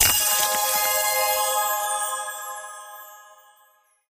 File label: bonus Pick Spins; secrets of christmas